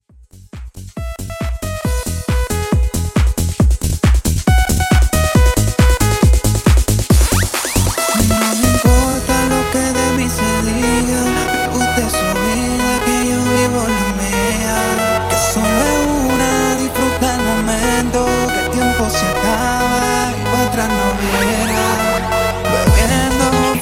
Extended 137Bpm

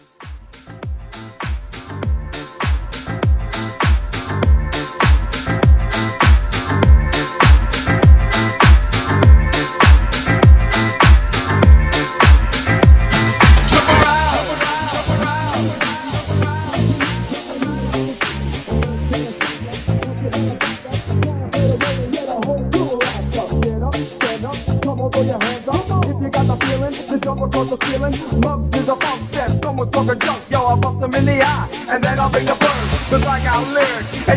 Funk House Transition; 100-124

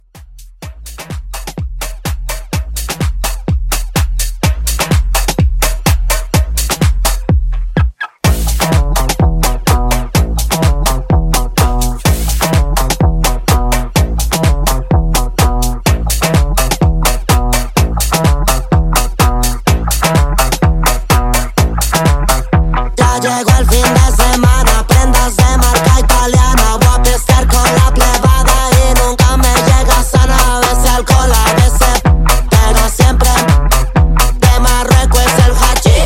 Re Drum

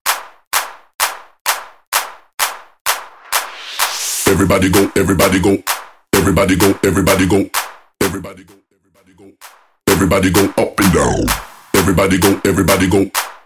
Moombahton Party Starter 67 5 Bpm Clean

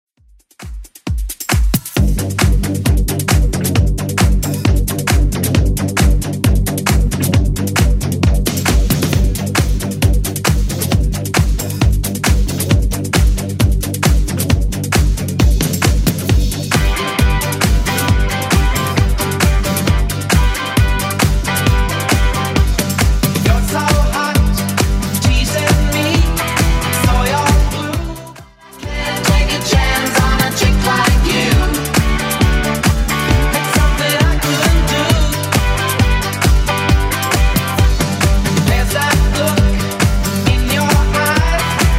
70s Club 134 bpm

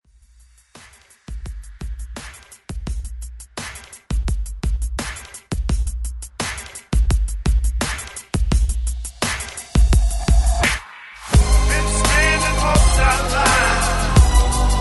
ReDrum 85 BPM

